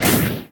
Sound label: skill fire appear; agar agar